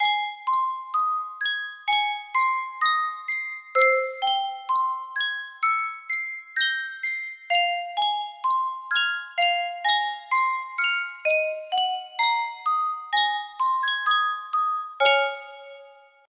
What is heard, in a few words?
Yunsheng music box